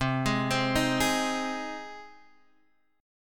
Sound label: C Minor Sharp 5th C down Guitar Half Step Down